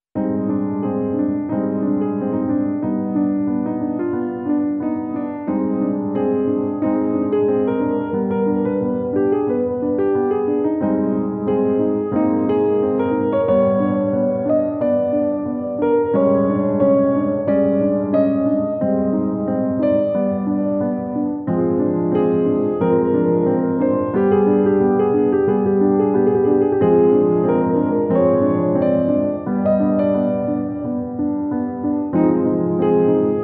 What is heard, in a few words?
Angst (piano); Hatsune Miku